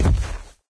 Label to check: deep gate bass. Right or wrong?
Wrong